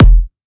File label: Kick Em; Sample Banks; Percussion